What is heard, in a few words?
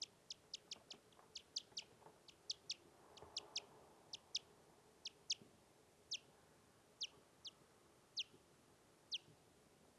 EFus far receiver